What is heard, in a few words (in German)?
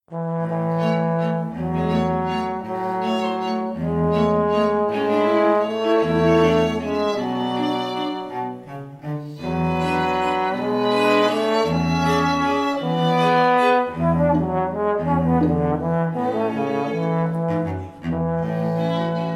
Klingelton Posaune; Android